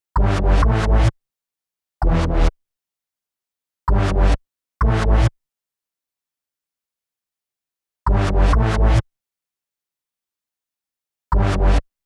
129 Em Synths, Complex Electro Synths; Prime Loops; Arena

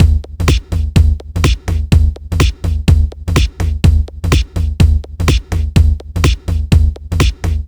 Digital Breaks, 125 BPM; Loopmasters; Jon Carter & Alex Blanco; Block Party Essentials

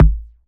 Kick

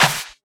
Claps & Snares

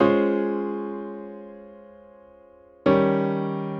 126 G# Eb music, Funky Underground; Loopmasters; Funky Underground Vol 2

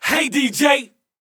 VOX

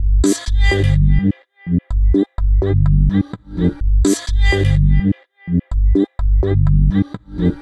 126 G COMBI GROOVE, funky; Loopmasters; Funky Underground Vol 2; COMBI GROOVES

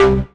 Synth Shot C; Mutekki Media; Vengeance Electroshock Vol.2

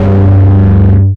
Bass Shot G

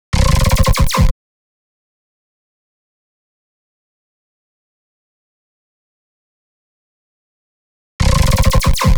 Amplify Bm Sfx, Complex Electro Synths, Bpm122; Prime Loops

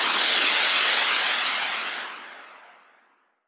FX Downlifter; Mutekki Media; Vengeance Electroshock Vol.2

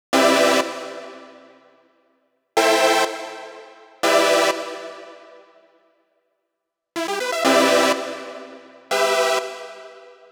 123 Em Synths; Complex Electro Synths; Prime Loops; Onyx

